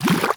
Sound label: fish flap; ambient; dota